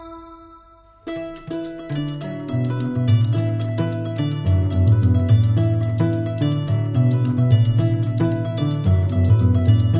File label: kora